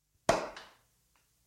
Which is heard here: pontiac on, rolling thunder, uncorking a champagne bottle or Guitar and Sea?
uncorking a champagne bottle